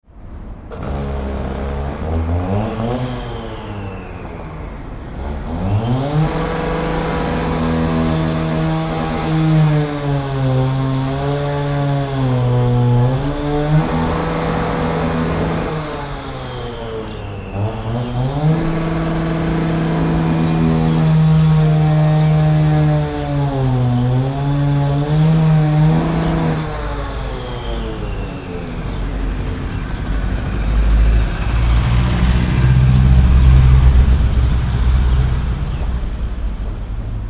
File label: Building Site 3 - Crane working - petrol cutter - traffic - site atmosph; Construction; Building Site 3 - Crane working - petrol cutter - traffic - site atmosphere